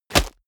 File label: combat plastic hit; krieg-waffen; nahkampf; soundpool